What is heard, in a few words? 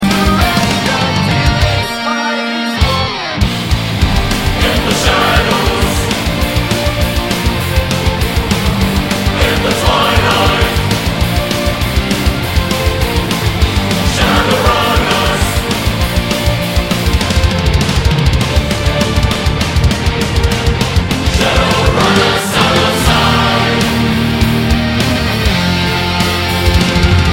Karaoke Version